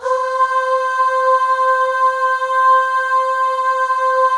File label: Voices, FEM AHS; Spectrasonics; FEM.1 AHS